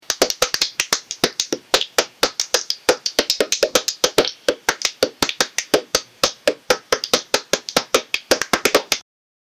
man chocking on popcorn o

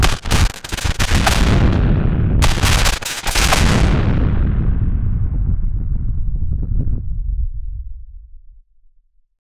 the sound of long bursts, automatic fire

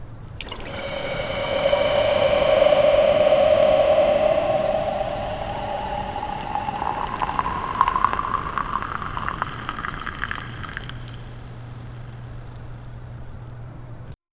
a tall cold draft peer, beverages

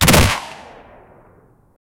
pistol gun fire; gunshots

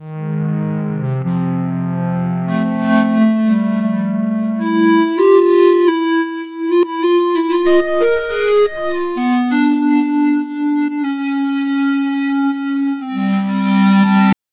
juicy rich 8bit minor, 8-bit

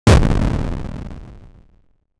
8 bit cannon blast deep; short decay

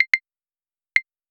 a light quiet level up so; animations

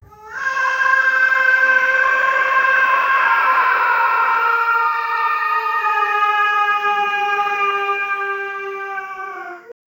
crying at a funeral

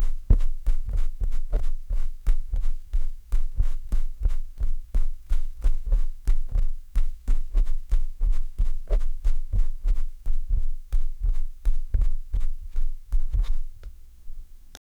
funny record scratch, awkward

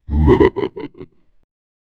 deep guttural demon laugh

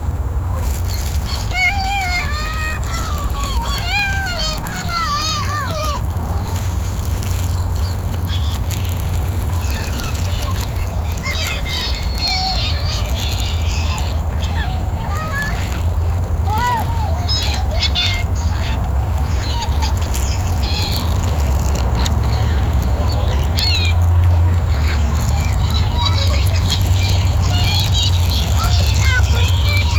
gentle sand movement toy, playground

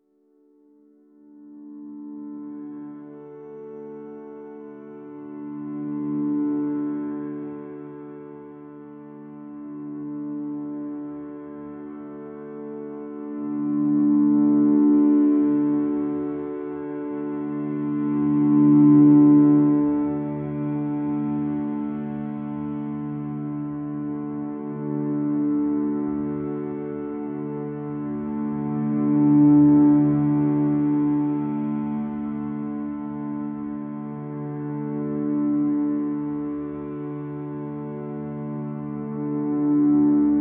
ambient drone with reversed piano

